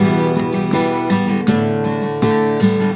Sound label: EMGTR; USB Soundscan vol.42; AKAI